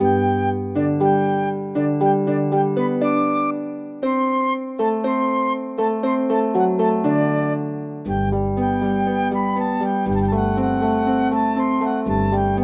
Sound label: Flute and Harp; Serenades for Flute and Harp Vol 1; eine kleine nachtmusik